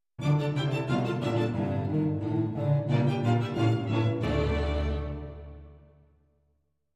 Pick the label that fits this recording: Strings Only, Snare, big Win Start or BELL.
Strings Only